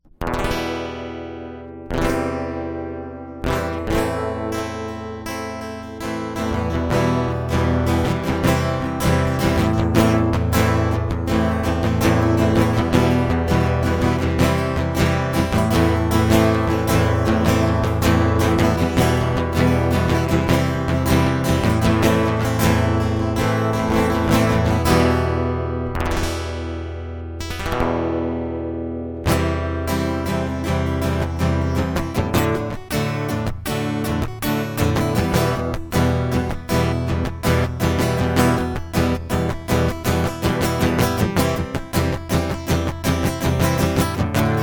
acoustic; MegaEpix

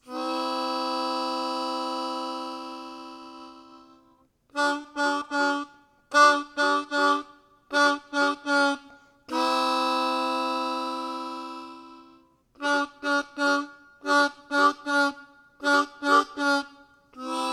harmonica; MegaEpix

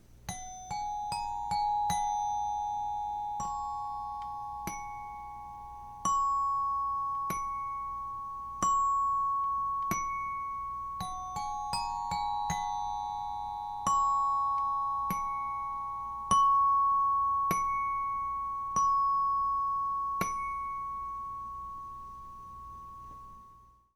xylophone

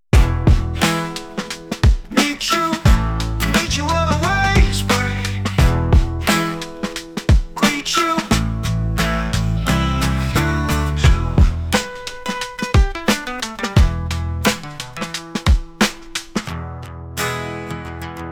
Unplugged

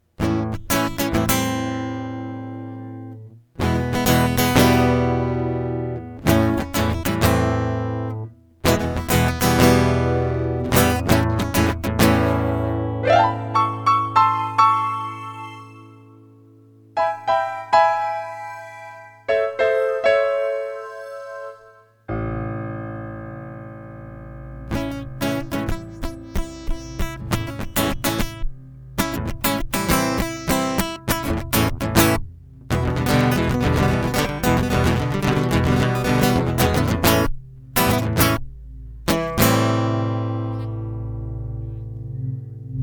Polyrhythmic intro

